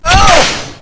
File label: gets hit ouch; Yoshi